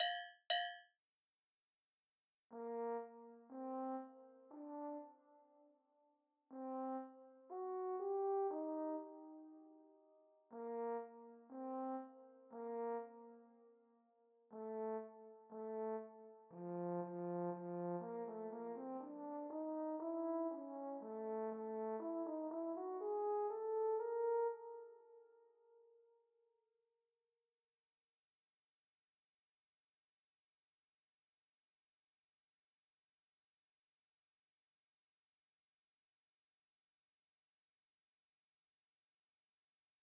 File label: French Horn Classical